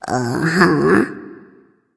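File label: nonverbal agree; smite; ratatoskr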